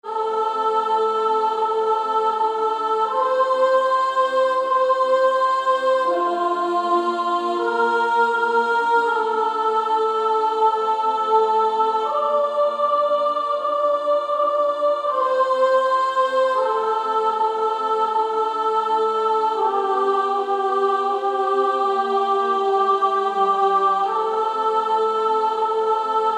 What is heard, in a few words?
Female Choir